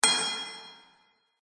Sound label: Metal Reverb Hit